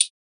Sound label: reso hat